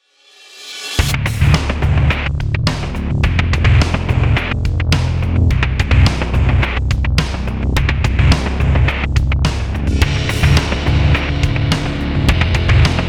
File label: music; Devil Guns Demon Bullet Hell Arena